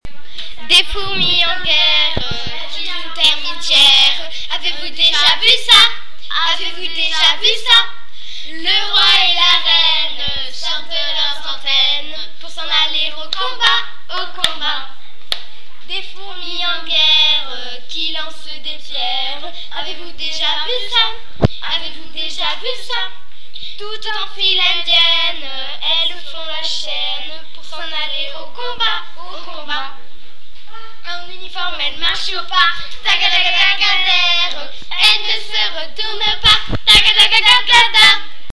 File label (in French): groupe, chant